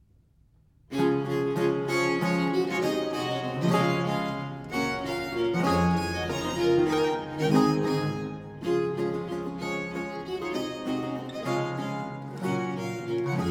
suite no. 2 in four parts, in d minor major, viols; early music